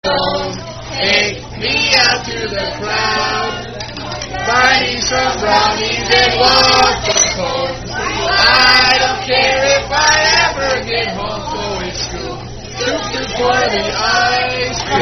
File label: Singing; Singing Teacher At Coldstone; Coldstone Creamery Song